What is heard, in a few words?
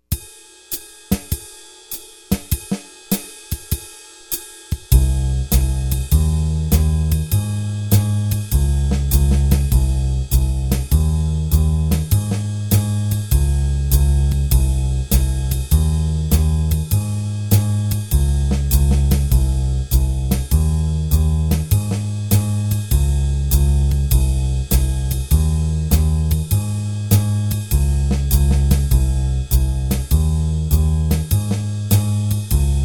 Turnaround 2 Bars Bass Drums